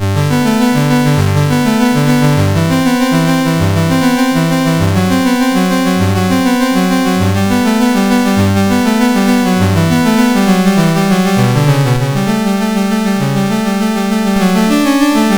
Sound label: Prelude from Suite I for Solo Violincello MOS6581R4